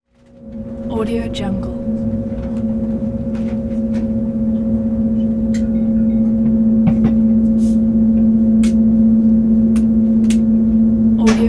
train inside

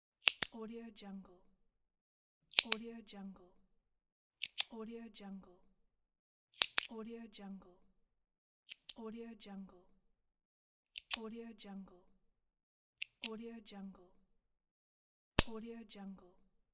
pen click

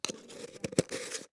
metal vitamin container lid

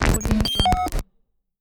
glitch; bogdansfx

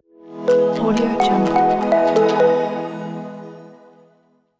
soft minimal logo

